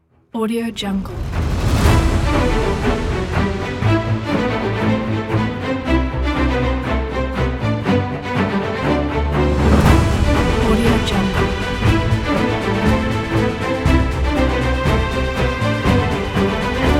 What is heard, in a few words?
china epic